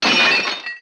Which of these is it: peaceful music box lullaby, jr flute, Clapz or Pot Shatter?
Pot Shatter